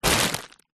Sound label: food frozen peas bag pick up; zapsplat